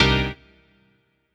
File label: CHORDS; EastWest; Dance Mega Hip Hop Electro